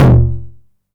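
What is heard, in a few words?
909 mid tom tape saturation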